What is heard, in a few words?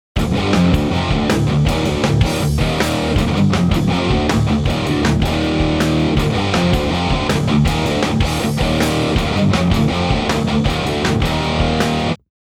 High Gain